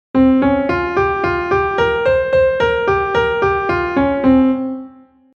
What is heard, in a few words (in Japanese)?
陰音階メロディ例